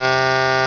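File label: Crumhorn B; Instrmnt; Sound Library 2000; Wayzata Technology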